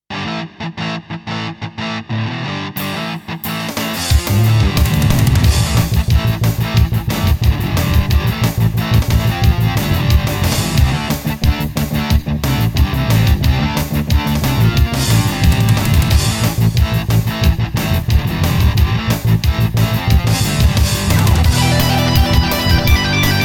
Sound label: Metal guitar